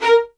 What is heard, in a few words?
A, LOUD VIOLINS; Q Up Arts - Denny Jaeger Master Violin Library